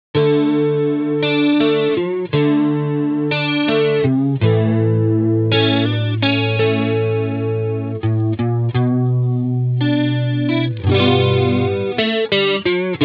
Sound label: Stereo Chorus